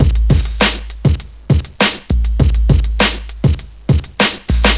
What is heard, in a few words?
breakbeat; World of Sound; instruments; beats